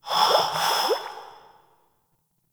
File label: Grineer Frogman Breathe; Warframe; Breathing